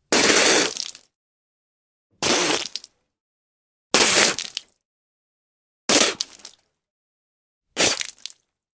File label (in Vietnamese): tổng hợp tiếng miệng phun nước